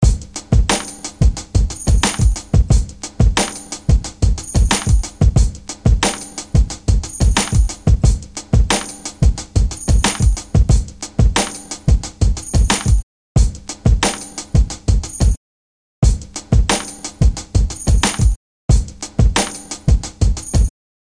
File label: beat